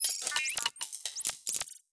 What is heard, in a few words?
ROBOTIC Transformation Insect Clicks Digital; stereo